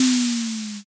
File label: shoji